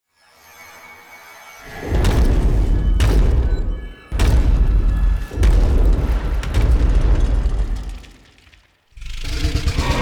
Exit Steps And Growl; Erra; Warframe; Veilbreaker; Kahl

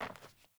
Sandals Walk Metal, Footsteps; Characters; Player

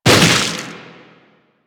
Proto Infested Runner Arm Attack Hit; Warframe; Abilities

